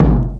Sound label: smooth bottom end kick single hit g sharp key; Duration 0:01; kick drum samples